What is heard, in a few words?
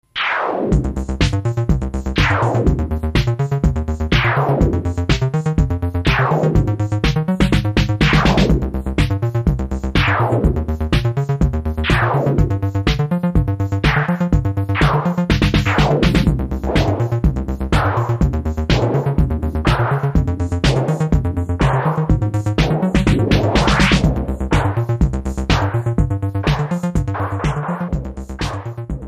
jingle